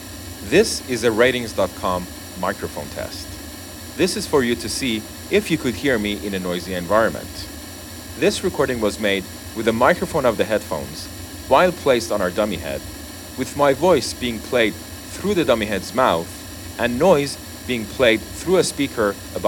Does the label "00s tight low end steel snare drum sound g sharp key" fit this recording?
No